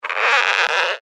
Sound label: Squeak Stretch, Texture, Squeak, Rubber, Burn; Objects; BlastWave